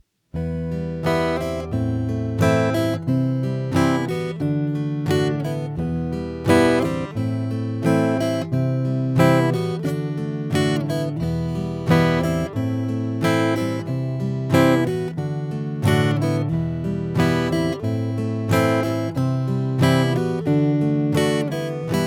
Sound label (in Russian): Boj s pereborom